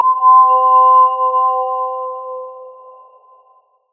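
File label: turning off printer power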